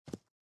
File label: SFX run rock